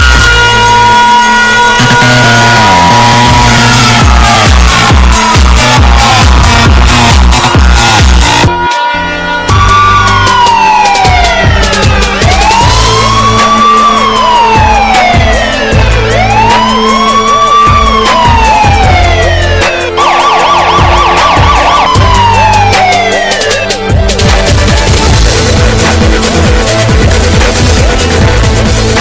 Ultimate Wakeup Tune

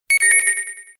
Alert

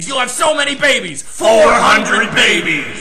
Babies

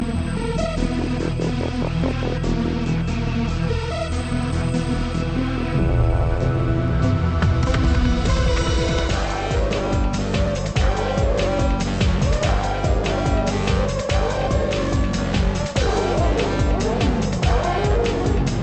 Technofight; Hellsing

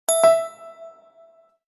Blackberry

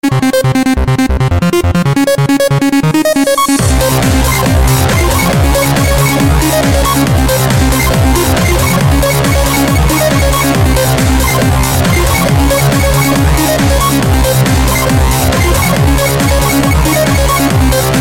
8bit Omg

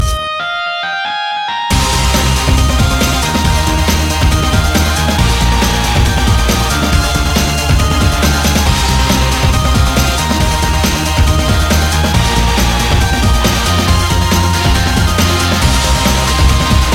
Tekno Mix

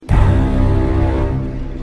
Braaahhmm

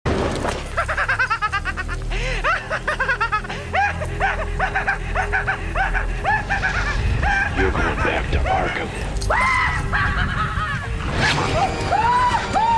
laugh; Joker

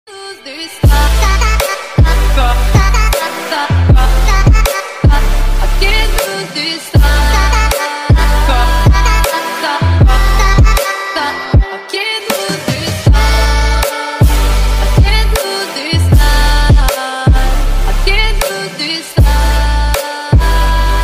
Arabic trap

